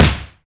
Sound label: snare sample c sharp key, Loudest frequency: 1903Hz; hits one shots